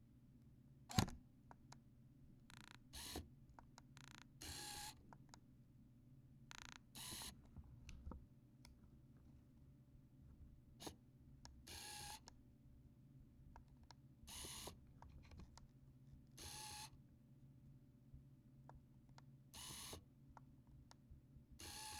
Zoom In Zoom Out

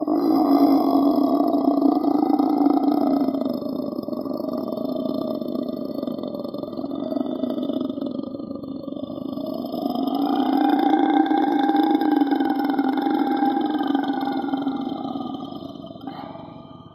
Killer Roar

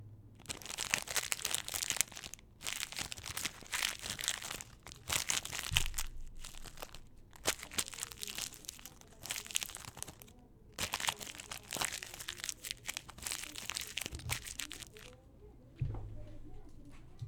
Wrapper Crumple

